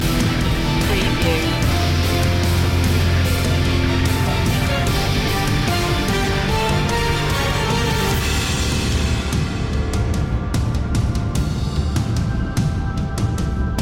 gothic trailer hero

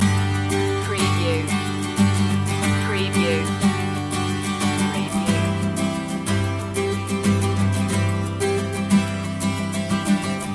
rearview loop

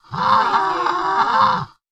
Alien Gasp Breath; sound effects bible

